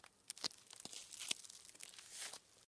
Climb; The 39 Steps

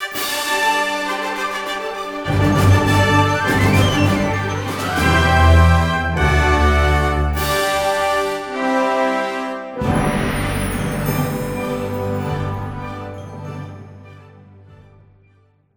Victory; superman returns; xbox 360

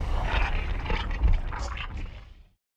Lava Hit

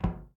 Footstep Metal; Among Us

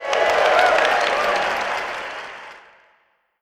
Cheer; Pac-Man Championship Edition 2 Plus

